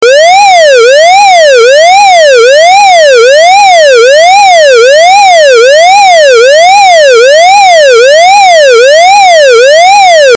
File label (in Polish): syrena